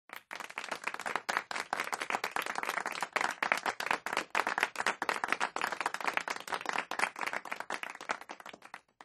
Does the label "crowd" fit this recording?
Yes